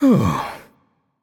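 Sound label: nonverbal sigh; voicepack; smite; merlin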